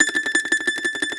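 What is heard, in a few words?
Mandolin tremolo, Plucked Strings; E-MU Producer Series Vol. 7 – Old World Instruments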